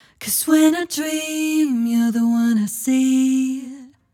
vocal phrase dry low harmony "when i dream" 128 Fm